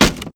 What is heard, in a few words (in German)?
block schild; mount blade